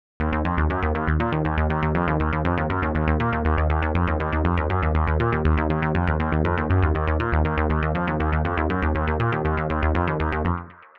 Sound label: one osc a; the analog sound waves